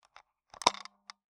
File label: Taxi Meter